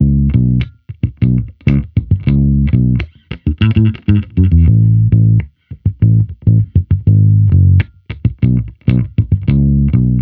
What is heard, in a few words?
Amped loop 100 Dbm, Electric Bass Loops; Slate Digital BASSHOLE Sample Pack